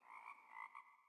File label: sting frog; Rust